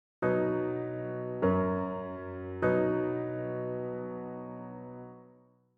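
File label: B major PAC; partially completed melodic dictation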